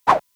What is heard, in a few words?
Casual Game Sounds; Casual Game Sounds U6